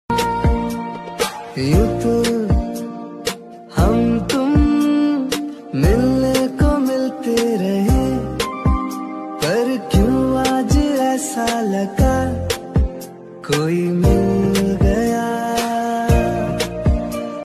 cover old song new version hindi ringtone bgm love ringtone